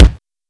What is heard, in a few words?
spinner bonus; osu!; NOva